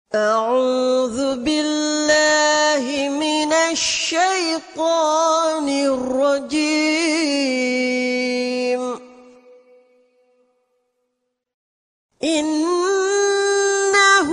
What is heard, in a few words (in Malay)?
Surah Al Haaqah (ayat 40-43); Quran